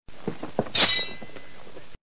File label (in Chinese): 冷兵器碰撞